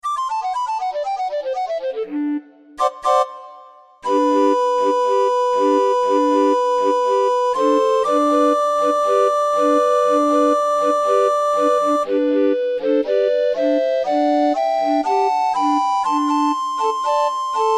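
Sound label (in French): orgue de barbarie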